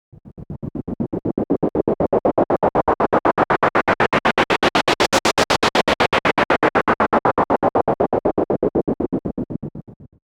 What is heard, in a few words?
Gated Noise 120BPM; musicradar